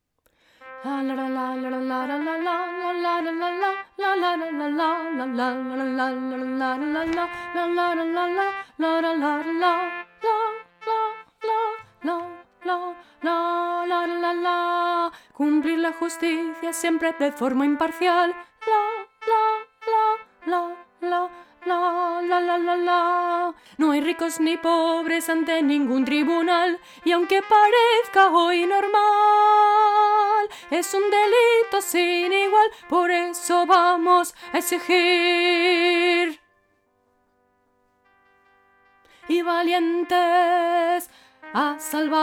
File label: alto